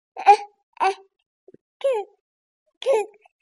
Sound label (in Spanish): bebe